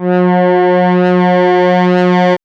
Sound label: MOOG B 5; AMG - Vince Clarke Lucky Bastard